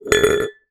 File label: glass jar large down concrete ground; household